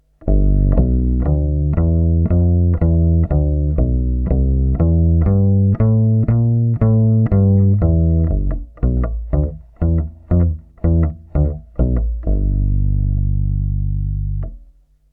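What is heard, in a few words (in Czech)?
Elektrický kontrabas - čistý zvuk (tady je jen jedna zvuková ukázka, piezzo snímač pod kobylkou, el. kontrabas Aria SWB Lite); NUX NPB-5 Melvin Lee Davis Bass Preamp & D.I. Box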